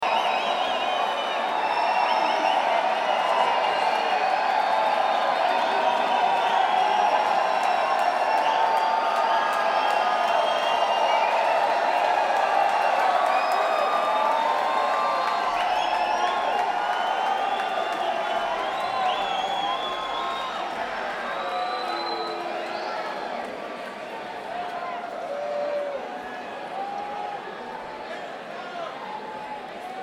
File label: Crowd